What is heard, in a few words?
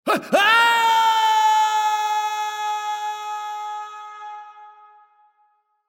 falls; Age of Empires III; cinematics